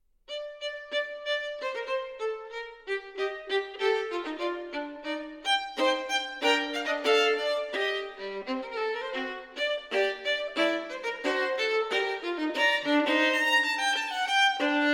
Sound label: violin sonata in G minor, fugue; violin sonata no 1; bwv 1001